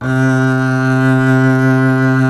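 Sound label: string contrabass C; Miroslav Vitous; Solo Instruments VOL-2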